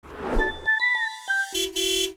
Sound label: correct